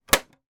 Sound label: toy plastic doll house door shut